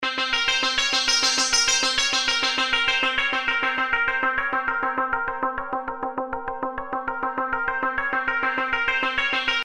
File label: loop